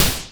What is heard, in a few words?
Cwejman S1 Sash Noise Snare